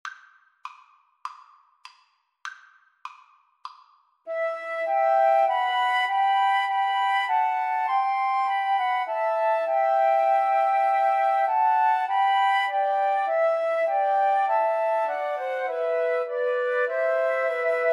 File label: emmanuel, Flute Trio